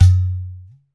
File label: UDU LO; AMG - Now CD-ROM (Roland); Rhythm Of Life